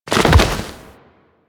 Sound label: Roll Forward Foley B; Enemies; Warframe; Teshin; DE